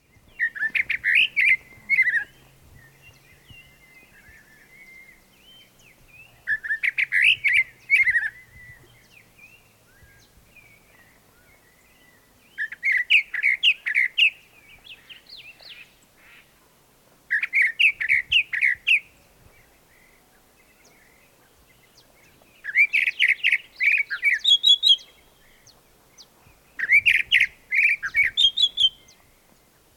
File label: Mimus gilvus